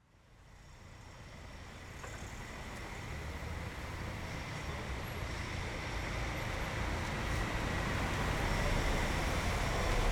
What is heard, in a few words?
City Street, Light, Ambience, Cars, Horn; Cityscapes And Streets